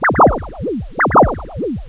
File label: alarm, ambience